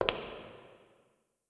Foot Step; blue archive

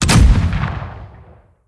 Shot; blue archive

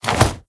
Umbrella; blue archive

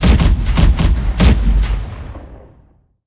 Artillery; blue archive

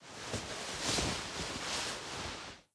Clothes; blue archive

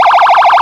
hyperyelp, sirens; code3 rls

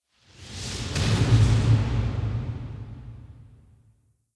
Missile Fly; blue archive

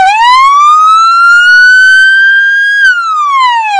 wail, sirens; canada

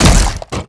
Drone Vulcan Attack Start; blue archive

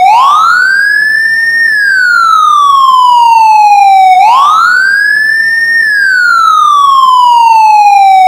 wail, sirens; soundoff nforce 400

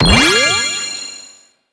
UI Character Growth Limit Break New; blue archive

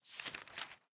Book; blue archive

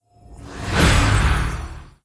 Portal; blue archive

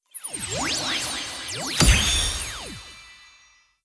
Weapon Get; blue archive

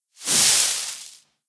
Hide Bush; blue archive

